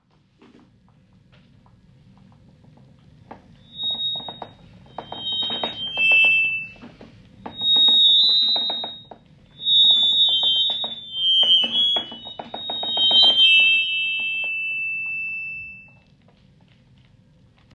Accordion sound; Maren Sofie Nyland Johansen

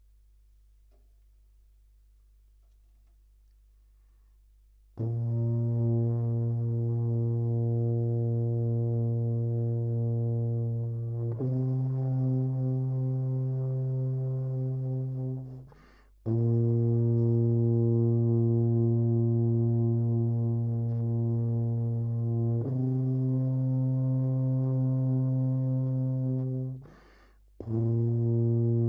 Trombone sound; Jakob Munck

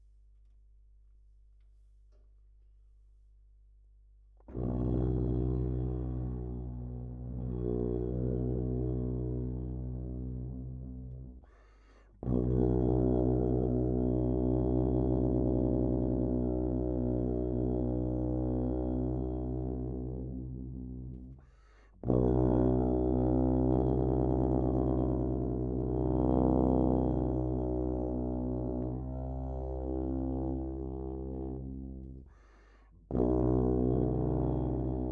Trombone sound; Jakob Munck

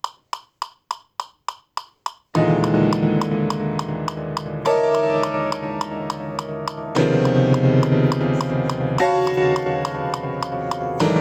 Piano sound

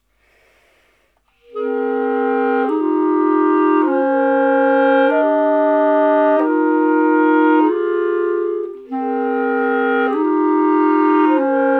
Clarinet sound; B

